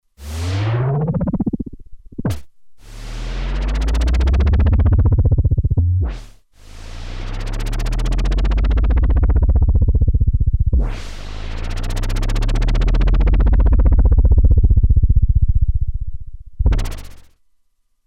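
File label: Wobble Copter, Roland Alpha Juno-2; Factory 2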